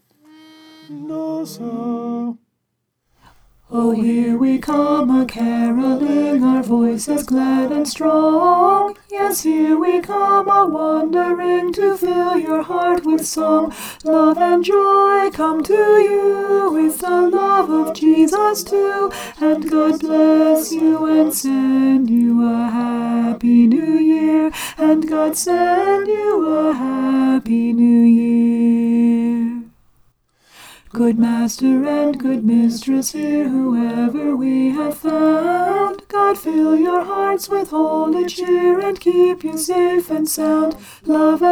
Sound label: alto